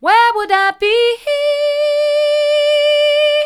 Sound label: where would i be, vocal